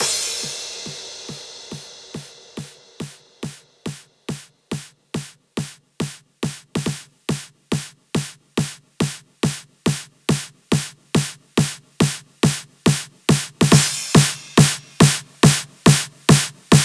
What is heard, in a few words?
140BPM Riot Filler Snare 16Bars, Hands Up Trance Fills; Vengeance Samples